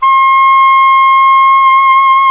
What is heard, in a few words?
SAX SOPSAX; SAXOPHONES; Roland - LA Composer vol. 1